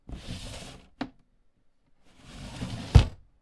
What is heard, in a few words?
Archive Cabinet Slide Open Close